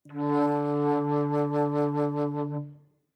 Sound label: Bass Flute ff D3; Woodwinds